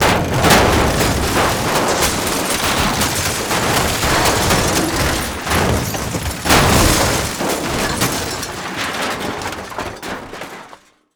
long break

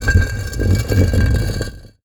rock slide

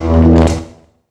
ambience disaster arc

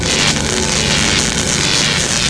steel cable zap

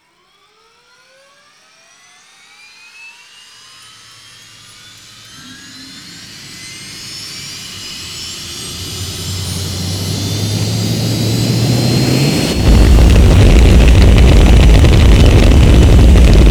rocket launch

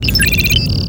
chirpy beep

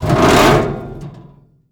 metal creak

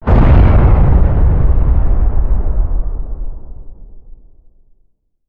soundscape indoor boom

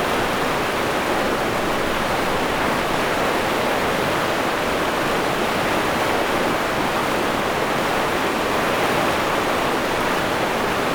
ambience water

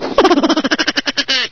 gremlin laugh

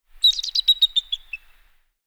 eagle

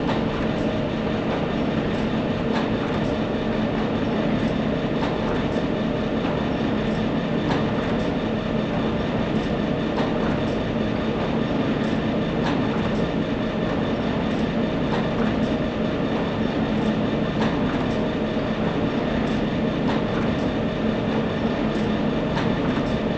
ambience industrial

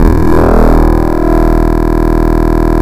ambience

